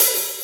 Open Hihat, Cymbals; Vengeance Samples

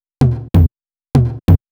Percussion, 128 BPM; Vengeance Samples; VTDS2 Song Kits

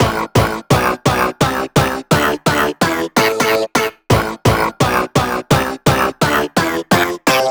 128BPM Melody; Vengeance Samples; VFH1 128BPM Meatball Kit

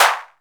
Claps; Vengeance Samples

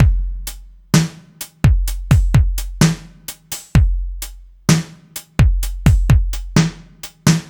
Slomo Beat 140 BPM, Loops, Slow Motion Beats

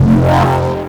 Acid Sounds F, Synths; Vengeance Samples

